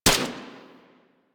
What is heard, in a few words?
Dual Soma Fire 2D C